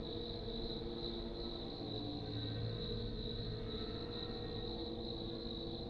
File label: Ambience Death Forest; Inotia 3